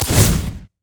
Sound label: light strike; LoL; lux